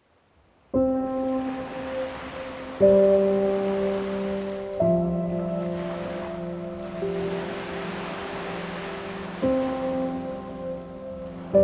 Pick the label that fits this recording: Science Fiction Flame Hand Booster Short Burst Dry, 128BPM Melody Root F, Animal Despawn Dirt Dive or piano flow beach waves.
piano flow beach waves